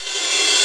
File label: Rev Crash; Quasimidi Rave-o-lution 309